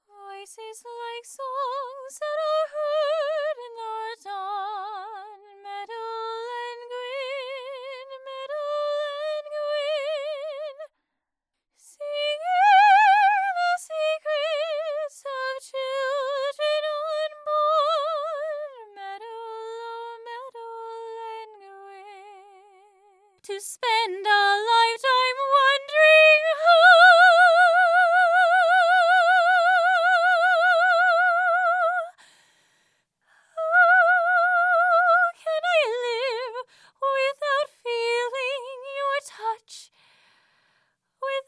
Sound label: singing